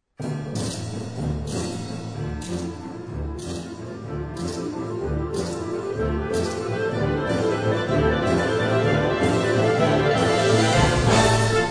Conga

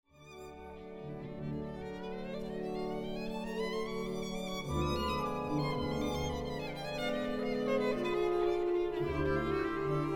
Violin

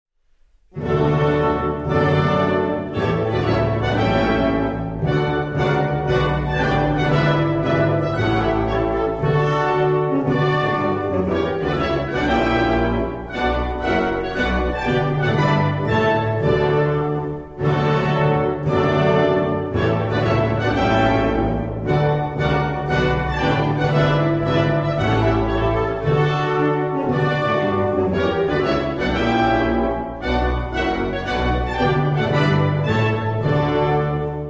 Marsch in D major

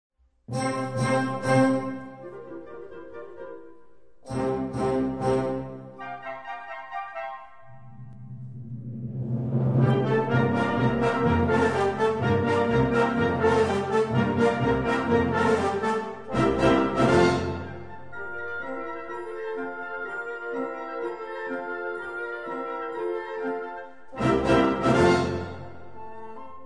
Danza

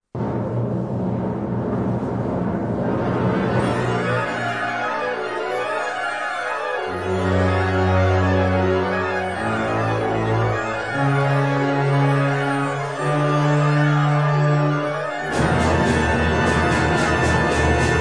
Sudwind

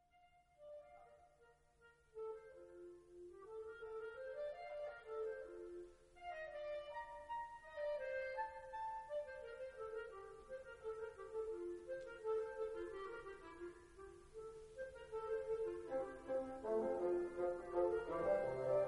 fuga

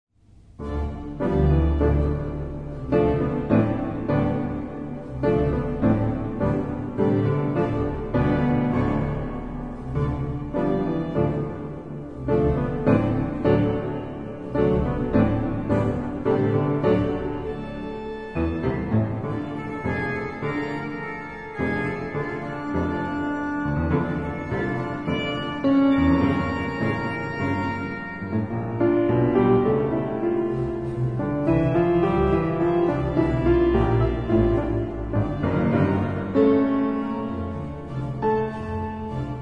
tango

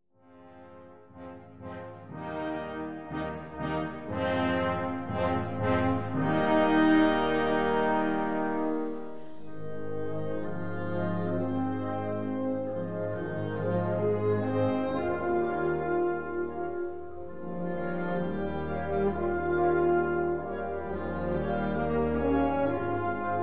Chinese temple

